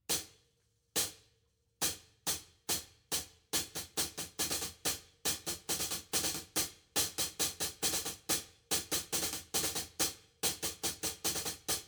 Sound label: Drums Only